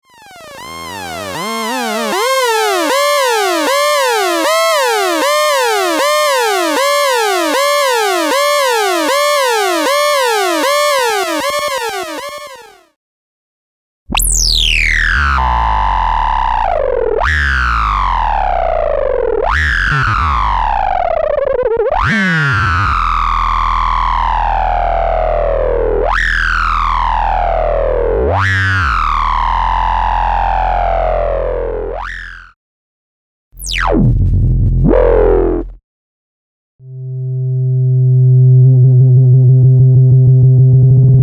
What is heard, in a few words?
EMS VCS3 Putney, synth; EMS VCS3 The Putney